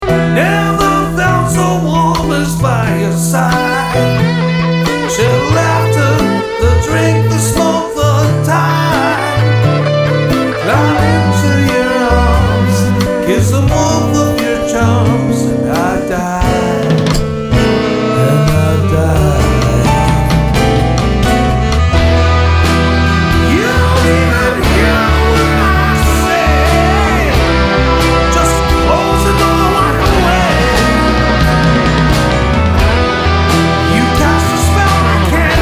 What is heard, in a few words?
Blues Singer; Toronto